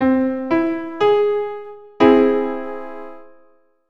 C+ Chord

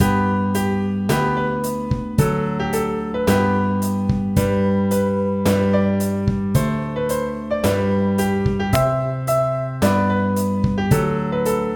Green Bottles, keyboard

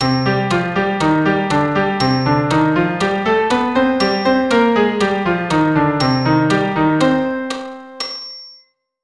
Cm melodic, keyboard

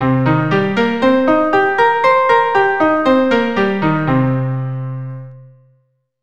Cminor7 Arp 100, keyboard; Cm7 Arpeggio

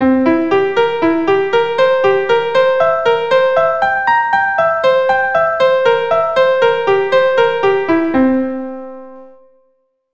C7 Broken chord RH, keyboard

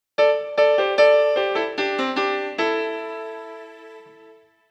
Am pent 4ths riff, keyboard

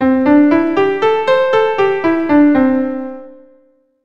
C Major Pent Mode

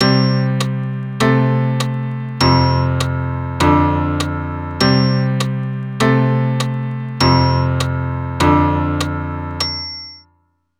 Boogie Woogie bass, keyboard

